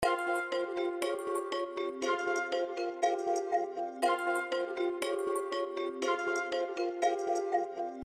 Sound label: 120 BPM; Loopkit